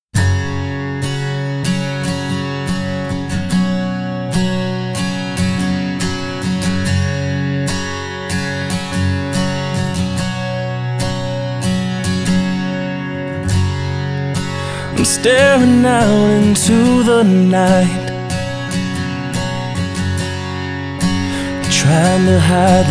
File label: Home Acoustic